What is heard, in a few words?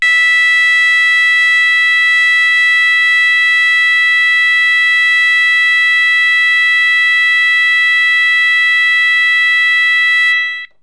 Sounder